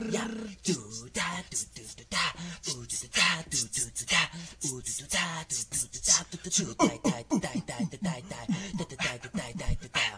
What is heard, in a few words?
mouth box